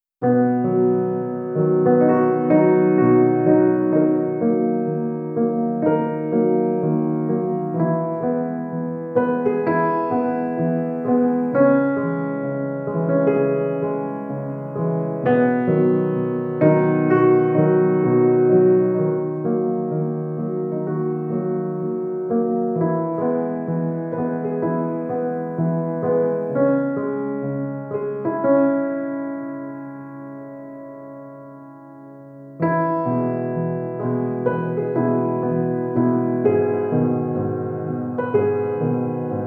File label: Canyon Melody (Instrumental)